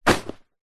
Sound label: foley luggage rucksack backpack drop on grass